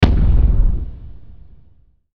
Hit Deep Low Hit Reverb; Deep Tone; Sound Design; Blastwave

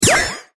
Alien Laser Ricochet; Laser Sound; science fiction sound effect; Andrew Fleming

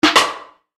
Chime Percussion; Multimedia Web Interactive; Hits (Sound Effects:Musical)

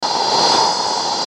Static Pan Sweep, Static Clip Glitch Chopper; Production Element Imaging Element Accent Transition; Blastwave; Static Sound Effects

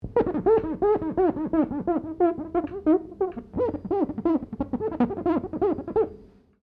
GLASS SQUEAK FINGER SEQUENCE

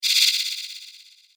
Fragmented Laser Dissolver; Laser Sound; science fiction sound effect; star wars / trek style games; Andrew Fleming

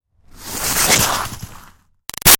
Snow Slide By Icy Scrape Bumpy; Skiing and Snowboarding; Sound Effects:Sports; Big Room Sound

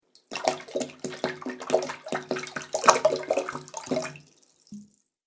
Water Bubble Sounds

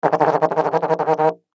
Baritone Silly, Baritone Silly Note Spit Sputter; Cartoon; Musical; Blastwave

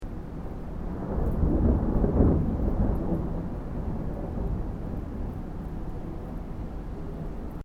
THUNDER DISTANT STEREO; Elemental weather effects; Thunder (Sound Effects:Weather)

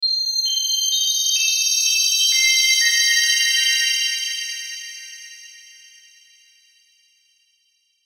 Logo Bell Descend Twinkle Strings Shimmer Pad; Sonic Logo; Blastwave; Sonic Logos; Production Element Imaging Element Accent Transition

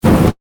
Flame Booster Short, Burst; Dry, Science, Fiction; Spacecraft

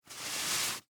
Rope Pull Twine; Hand Tools (Sound Effects:Tools); BlastWave

